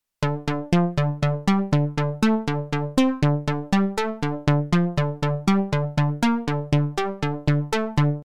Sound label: OBXa V ARP